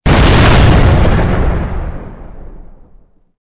explosion short; weapons; Soldier Of Fortune II Double Helix; XBOX

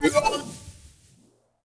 power Up

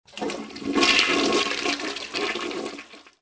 flush; Soldier Of Fortune II Double Helix; XBOX

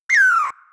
Squirrell; Animals; XBOX; BassProShopsTrophyHunter2007

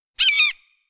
Hawk Single Call; Animals; Birds

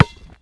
ball, Impact

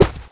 Bullet Dirt, Impacts

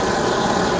Flame Loop; Star Wars Knights Of The Old Republic II; XBOX

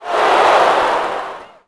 NEG Reaction,Crowd; Cars; XBOX

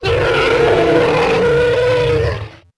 Carcha ROAR, Dino

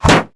leadpipe metal hit; projectiles; melee hit; Max Payne

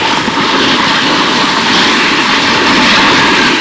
Pack Run Short; XBOX; Amped 2

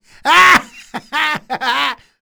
laugh; Cars

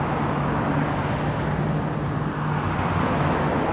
Amb Traffic; Cars; XBOX; Ambient

